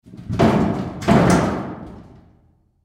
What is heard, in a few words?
Sheet Metal Move, Sheet Metal Movement Large; Foley; Materials; Blastwave